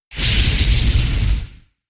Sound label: skill effect